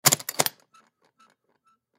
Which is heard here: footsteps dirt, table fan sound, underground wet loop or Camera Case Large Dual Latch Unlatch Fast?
Camera Case Large Dual Latch Unlatch Fast